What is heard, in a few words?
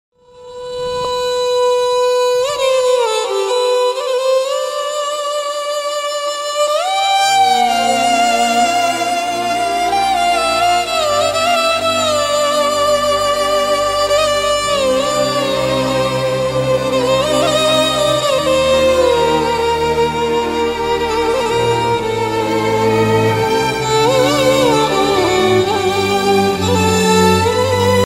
background music